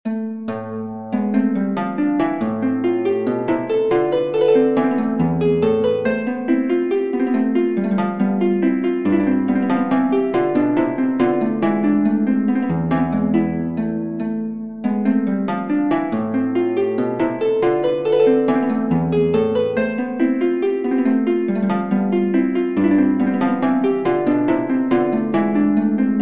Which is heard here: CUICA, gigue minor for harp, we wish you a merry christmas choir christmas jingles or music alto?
gigue minor for harp